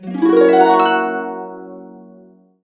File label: GB, Harps; USB Soundscan vol.62; AKAI